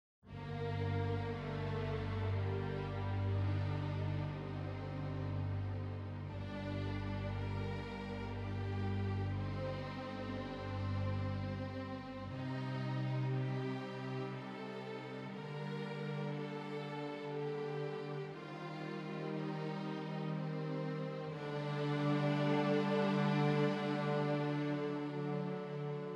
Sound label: Soprano; Practice Room